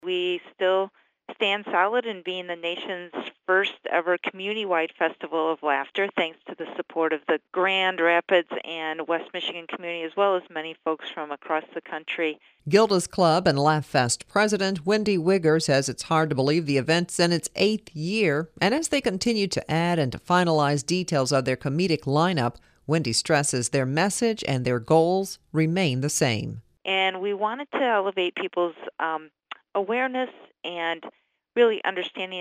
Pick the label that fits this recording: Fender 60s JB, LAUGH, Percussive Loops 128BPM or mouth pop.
LAUGH